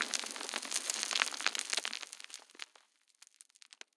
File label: rockfall; dust2